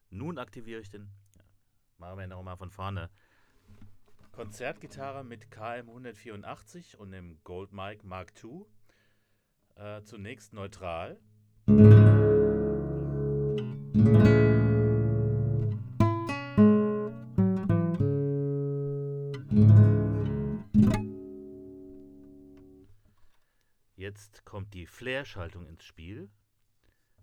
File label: nylonstring; goldmike mk2